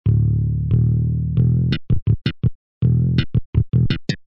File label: dirty slap; Acoustic Bass